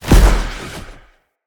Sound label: flock projectile impact; Enemy; Abilities; Sinecure; Ranged Hunter Thawtide